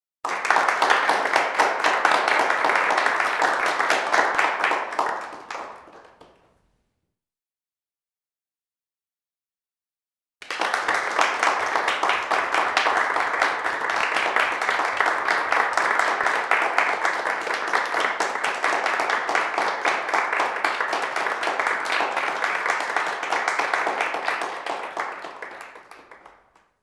Applause Indoor Small Crowd In Large Room, Light

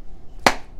ball Glove

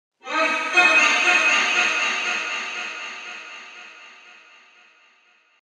space mite laugh

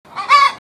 bock

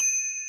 beep

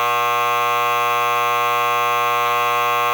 buzz game over

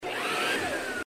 vacuum

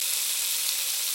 bacon

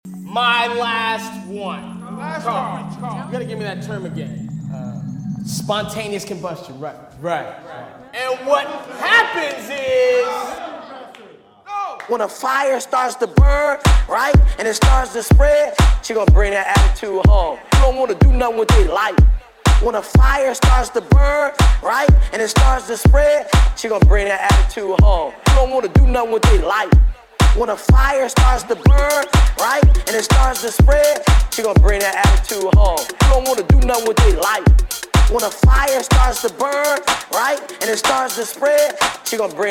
music

